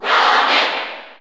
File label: Cheer English; Robin; SSB4 SSBU